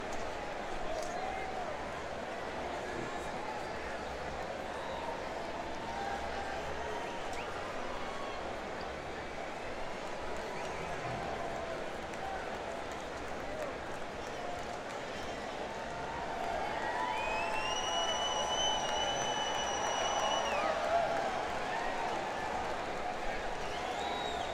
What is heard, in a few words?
Crowd; Miami, FL - American Airlines Arena